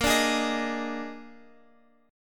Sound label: A sharp 11th chord